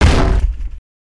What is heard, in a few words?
impact; emotes